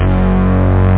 piano bass; oopsup-pianobass

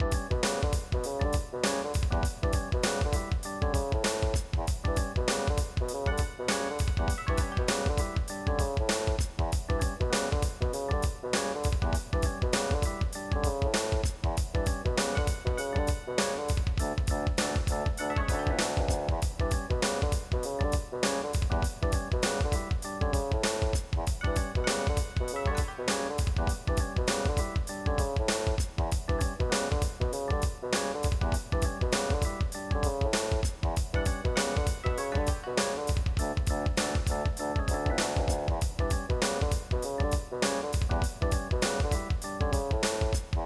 Funk

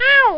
oooww

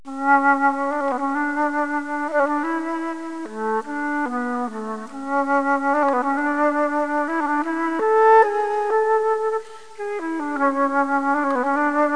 flute; 8bit

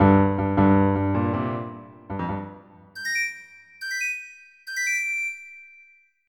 reveil; 3D Action & Classic Games; MIDI Music

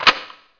ROLL OVER; Slave Zero Demo

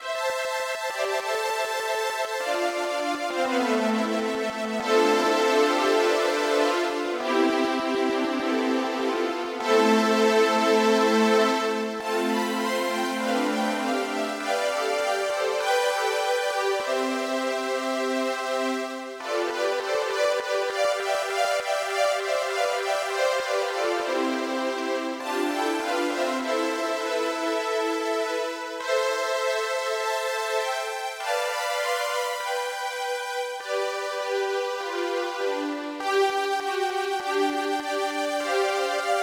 CHORALE

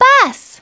Bus; Treasure Galaxy; Learning Company

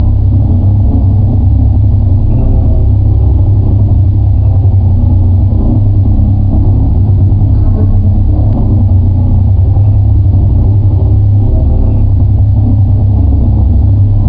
Wind Metal Low

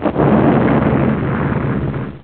bomb; Giza 2.0

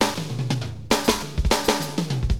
DM LOOP, HipHop, Drums

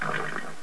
horse

